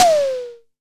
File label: Disco Tom - Long; Tama TS-206